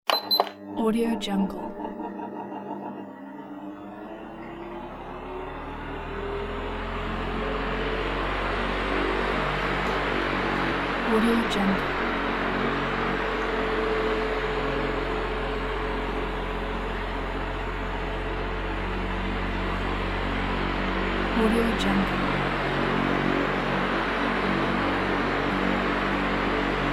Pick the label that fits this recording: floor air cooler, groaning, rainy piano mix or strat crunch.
floor air cooler